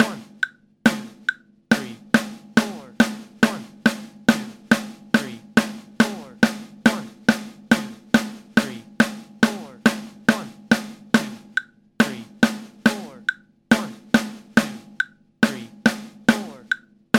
Eighth Notes 70 BPM; Drum Lessons

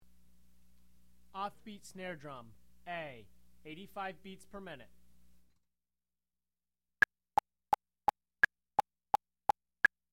off beat snare drum; Drum Lessons

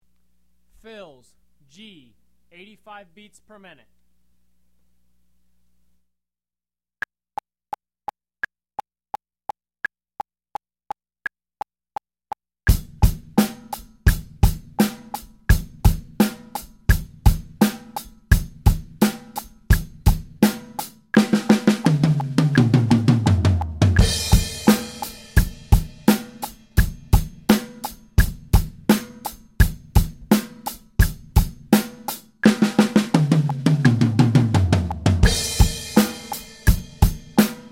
Beginner Fills 85BPM; Drum Lessons

